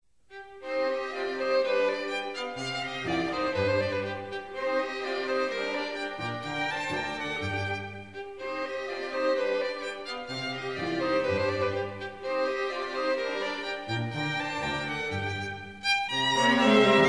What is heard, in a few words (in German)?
Streichquintett